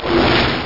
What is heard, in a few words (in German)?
wasser; batlords